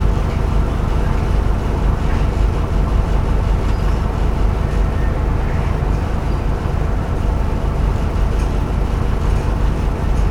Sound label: transportation bus idle internal; sound effects one